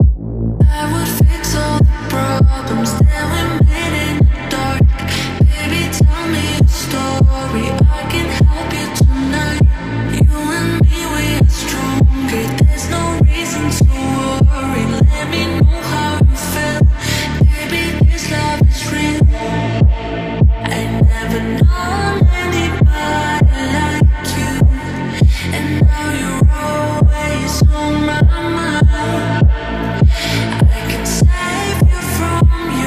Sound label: slowed